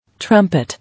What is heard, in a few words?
Trumpet